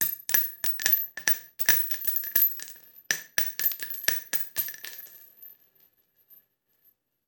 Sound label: Bullet Shell; Sound Ideas; Guns